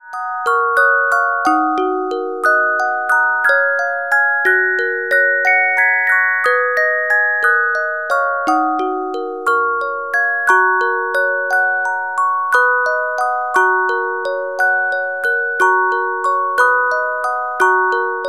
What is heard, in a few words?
Carillon Music Box Melodies